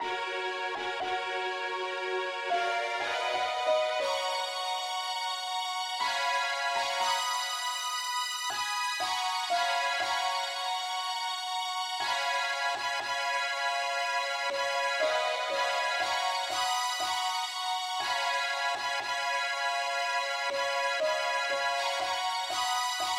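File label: uke lead key F sharp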